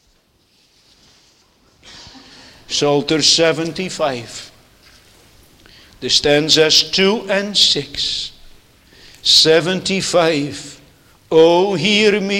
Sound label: Singing; Netherlands Reformed Congregation, St. Catharines, ON